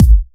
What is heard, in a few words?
Trance Kicks